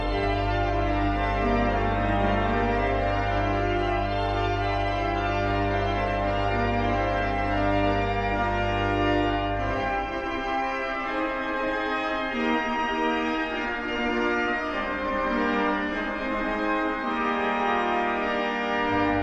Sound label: organ; Santiago; Voluntary